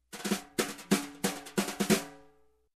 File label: Jazzy Snare Upbeat